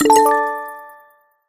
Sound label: Character Confirm